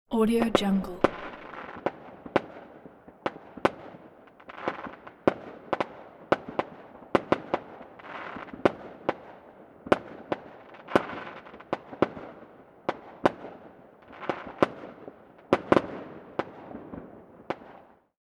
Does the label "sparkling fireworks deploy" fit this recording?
Yes